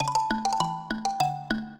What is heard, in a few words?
minuet, mbira; Mozart